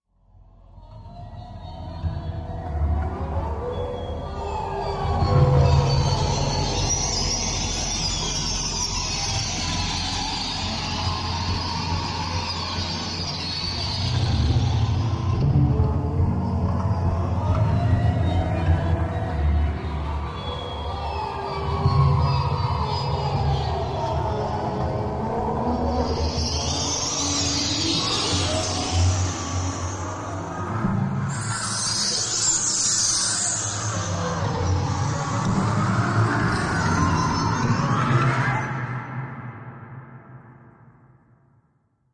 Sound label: Haunted Ferris Wheel, horror